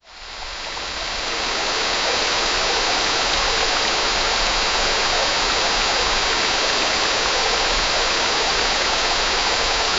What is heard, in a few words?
a fly over a river, flyby